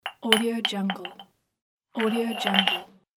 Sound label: ceramic; 3dhome